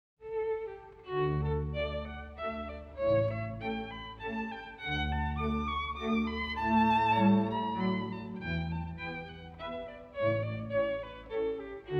Divertimento No. 17 in D Major, K. 334 III. Menuetto; Mozart, Wolfgang Amadeus